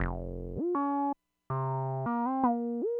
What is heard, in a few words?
slowsong